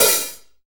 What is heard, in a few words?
Room HH med, Room Drums; Akai MPC500